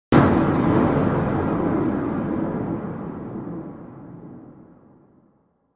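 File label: Impact Metallic Clang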